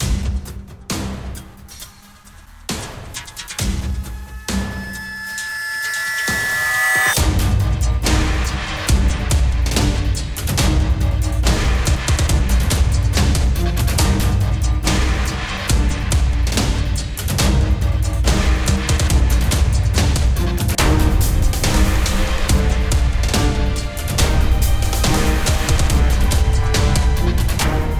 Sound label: Game Music; Asteroids